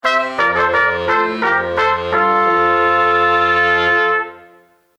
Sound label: lost round